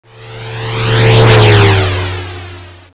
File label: Car Shwoosh